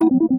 ui randomizer click; Stellaris

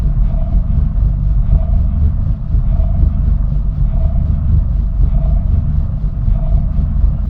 planet killer windup; apocalypse

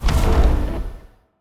healing hit, weapons; Stellaris; biogenesis

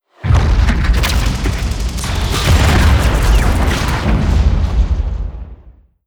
space battle; Stellaris

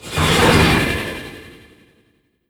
moving out battleship; Stellaris

